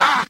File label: "ah", male